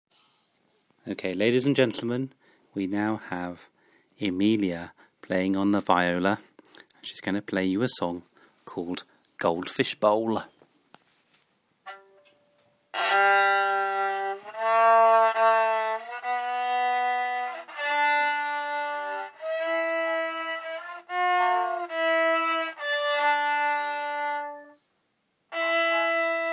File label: goldfish bowl on viola